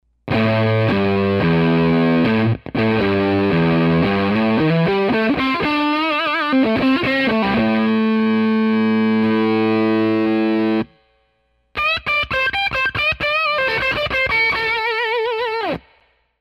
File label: strat